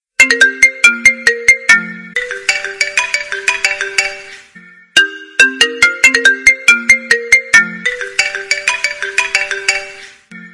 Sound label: apple iphone 12 pro max ringtone